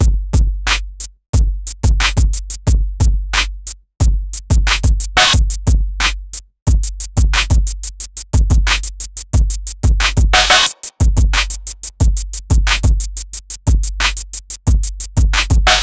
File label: oldschool hip hop scratch; dj effects